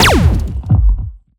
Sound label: weapon scifi shot; invader; Bluezone Corporation